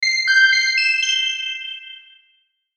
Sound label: Logo Chime Melody; Sonic Logo; Blastwave; Sonic Logos; Production Element Imaging Element Accent Transition